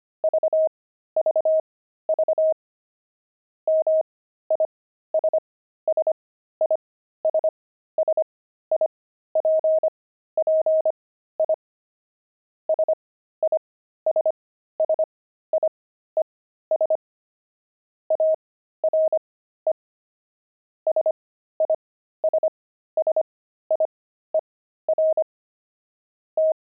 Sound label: pangram, intermediate pangrams files